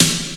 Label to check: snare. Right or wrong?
Right